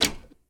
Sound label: Switch Ready; Game rip; MiSide